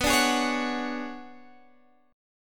B Minor 6th Add 9th B down Guitar Half Step Down; Bm6add9

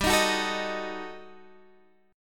Eb Diminished, guitar chord; Ebdim/G strummed; JGuitar

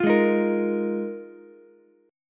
F Minor 11th, Ukulele C6; Fm11; strummed; 10,8,11,13; JGuitar

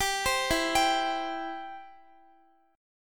E Minor Sharp 5th, Guitar, 4E,4A,5D,5G,5C,6F; arpeggiated; Em#5; x,x,17,17,16,14

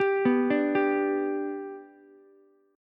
B Minor Sharp 5th, Ukulele; Bm#5 arpeggiated; JGuitar

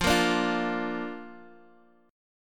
Bb Major, chord

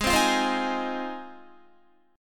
G sharp 6th, Guitar; G#6; strummed

